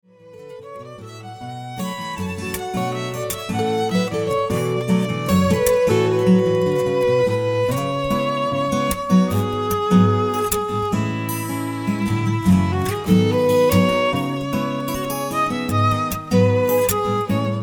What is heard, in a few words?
Guitar Player